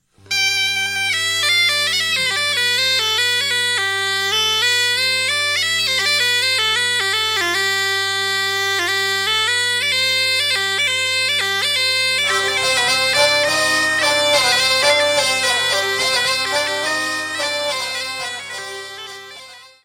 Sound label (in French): Bourree